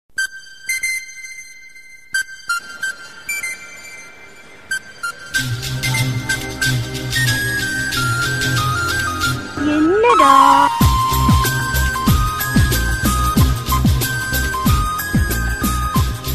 indian bgm

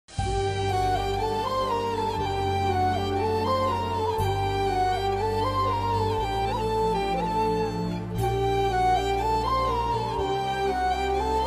sad bgm ringtone, heart touching sad bgm ringtone; village life

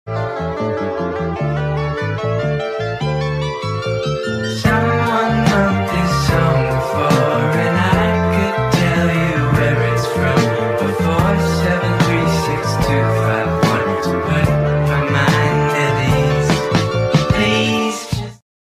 ringtone

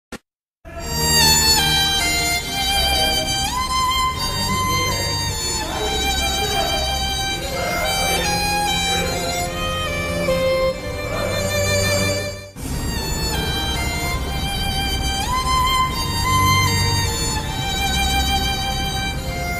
anniyan ambi sad bgm

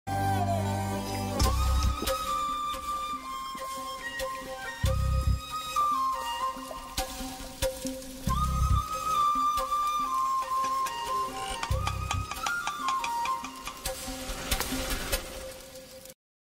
sad flute ringtone; khadgam movie

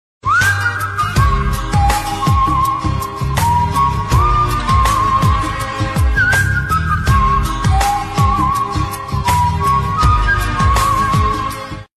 whistle ringtone; instagram trending reels original audio

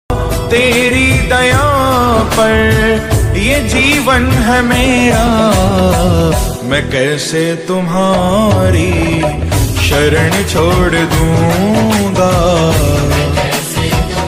krishna bhajan status; shri krishna status

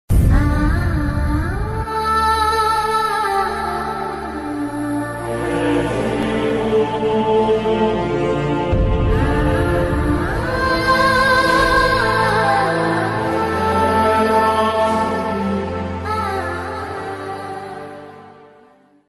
movie songs ringtone; magadheera

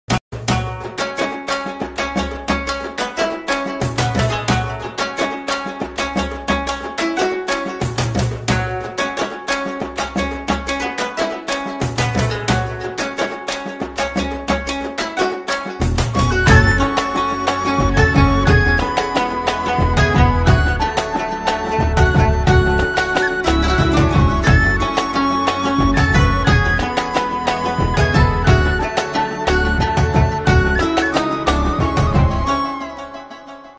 tamil love bgm ringtone

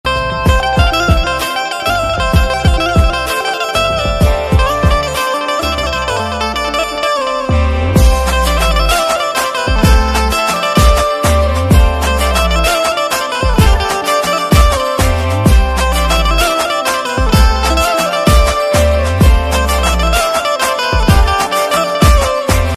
banjo ringtone, marathi ringtone

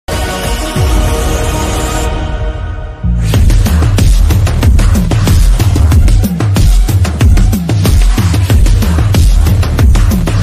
bass boosted version bgm ringtone; leo; thalapathy vijay

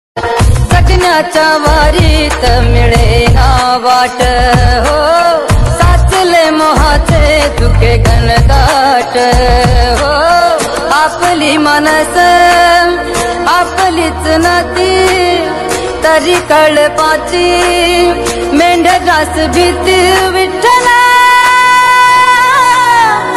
marathi ringtone god ringtone; Vitthal ringtone

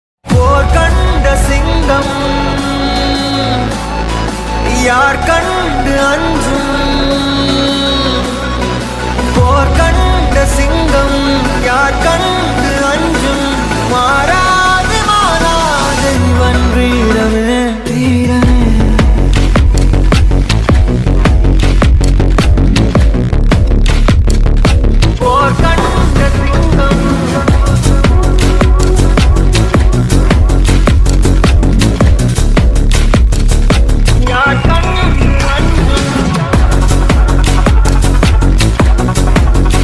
song edm version bgm ringtone

